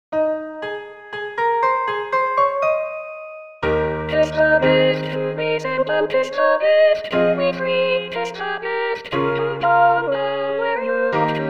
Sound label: Simple gifts All